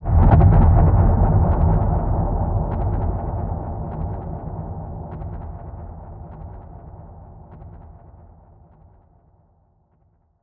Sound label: Impact